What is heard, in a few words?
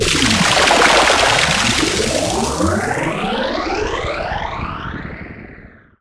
NOISE, Production Effects